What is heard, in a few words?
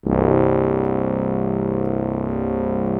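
BRASS C1 left, ORCH BRASS; Greytsounds VOL-1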